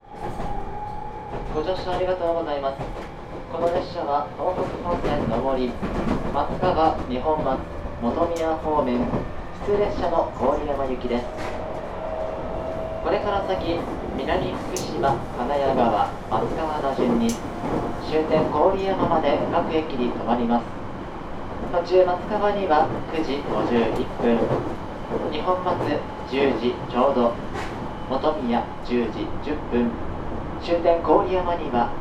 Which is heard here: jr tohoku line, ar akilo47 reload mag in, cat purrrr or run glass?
jr tohoku line